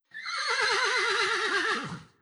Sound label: Horse Death